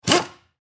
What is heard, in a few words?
Air Ratchet Tighten, Air Ratchet Single Short Tighten Pneumatic; Power Tool; Industry; Power Tools; Blastwave